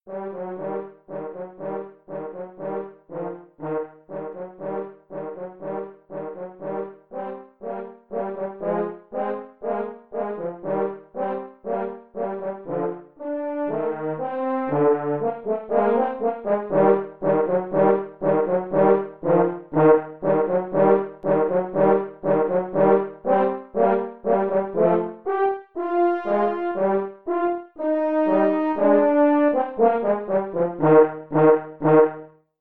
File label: Easy Classic Duets March, French Horn; Radetzky March